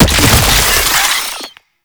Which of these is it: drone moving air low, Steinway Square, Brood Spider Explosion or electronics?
Brood Spider Explosion